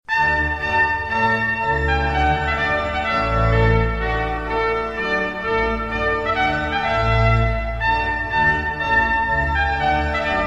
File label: Wedding Music excerpts; Purcell